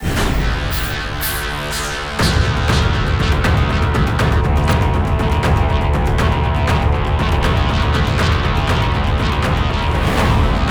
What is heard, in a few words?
Landing End